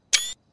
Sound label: screenshot capture sound; steam